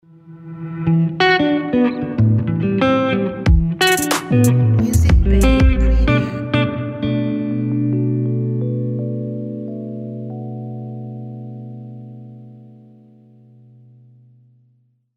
RnB Guitar Logo

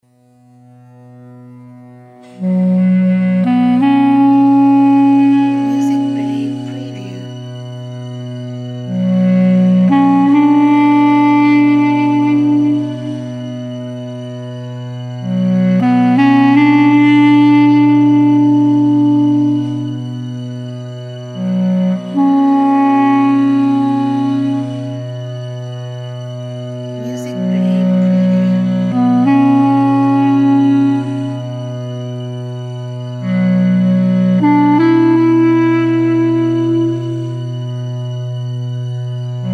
Arabic Prayer

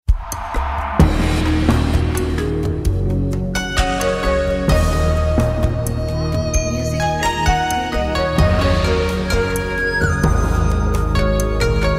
Japan Ambience

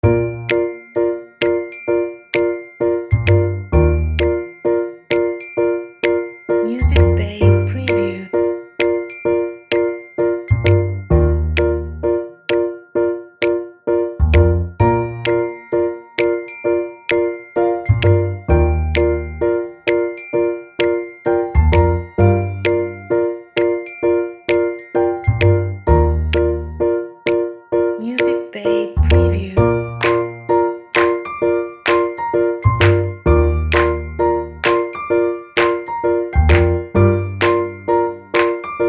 Cute Happy Children Play

